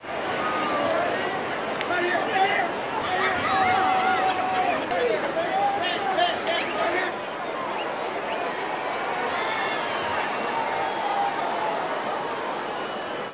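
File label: baseball crowd ambience chatter; Crowds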